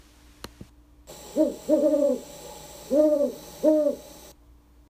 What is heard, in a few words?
Male GHOW